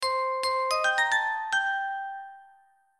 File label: Theme Chime